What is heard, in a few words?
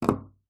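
trophy set down glass shelf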